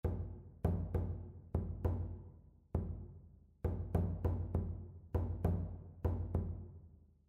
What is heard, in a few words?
rhythmic error detection; ear training